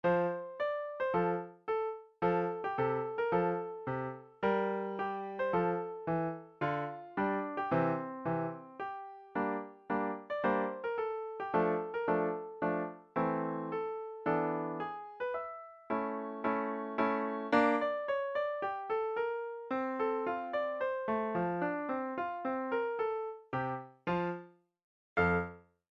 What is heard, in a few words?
Toreador Dotted Eighth, beginner piano music